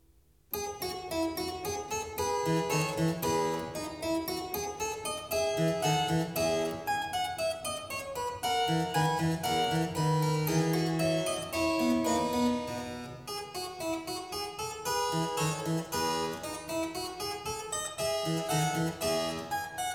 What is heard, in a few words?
E major, Menuet; French Suite No.6; BWV 817; BACH; CLAVIER WORKS; FRENCH SUITES